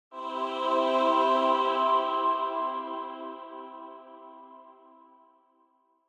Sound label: Angel, High Reverb